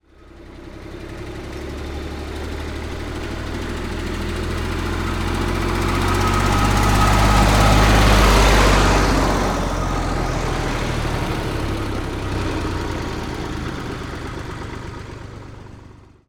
Eicher Tiger II EXTERIOR Pass By Medium Decelerating, 1962 EM235 Tractor; MONO; Dramatic Cat